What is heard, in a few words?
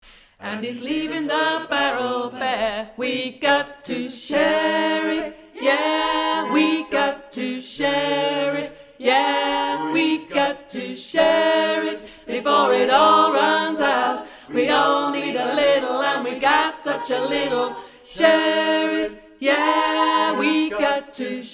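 Tenor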